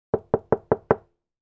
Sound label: Door knock sound effect